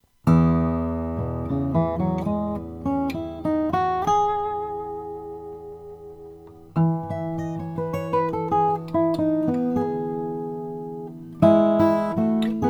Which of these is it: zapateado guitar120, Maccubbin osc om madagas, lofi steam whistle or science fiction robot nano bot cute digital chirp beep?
Maccubbin osc om madagas